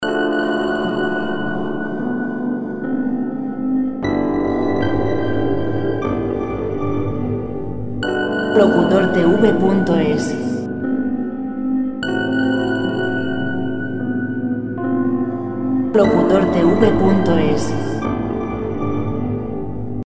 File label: piano